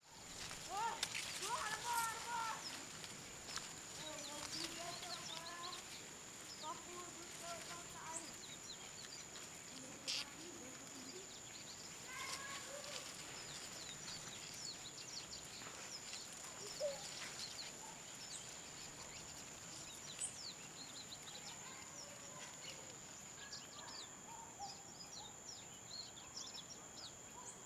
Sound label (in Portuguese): Criancas brincando na rua; sao jorge goias